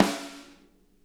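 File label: snare edge; Sonic Reality; Interactive Drum Kits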